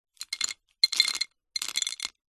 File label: food savory snack wasabi peas in ceramic bowl handful drop in; zapsplat